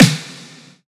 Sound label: snare thud; Drum One Shots; Slate; SD MIDNIGHT Sample Pack